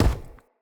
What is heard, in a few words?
Step Wood, Footsteps; Enemy; Corrupted Sproutfolk